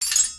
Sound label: xylophone